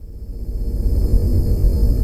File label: SPC SHIP; Greytsounds VOL-1